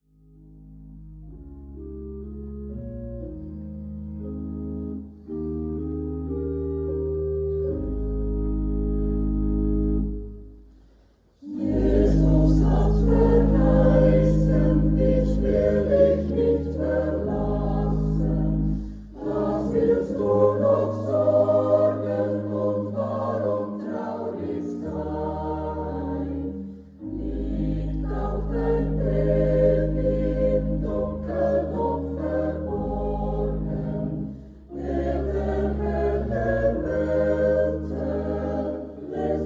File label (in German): Jesus hat verheissen, Kirchenchor